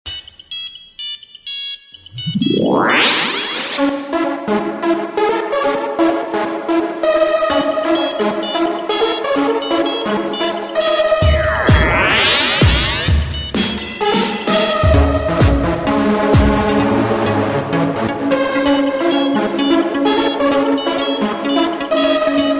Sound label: Nokia Technomix